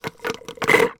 human drinking slurping through straw last dregs soda from fast food cup short